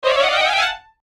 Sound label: Chime Strings Ascend; Blastwave